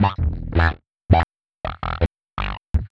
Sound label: Funk Riff